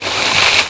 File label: dolphin out; Nintendo; July 2020 Gigaleak